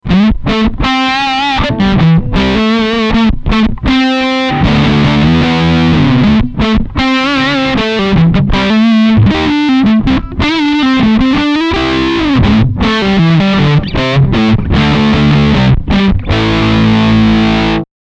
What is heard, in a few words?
Stoned Fuzz